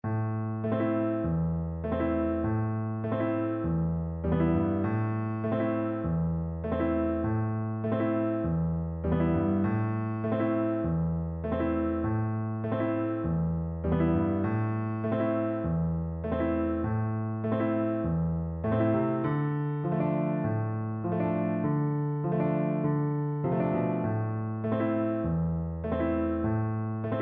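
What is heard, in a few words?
viola karaoke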